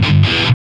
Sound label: GTR; Roland - LA Composer vol. 1